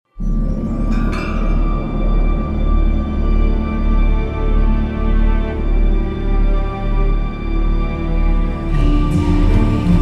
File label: music death; stingers; dota2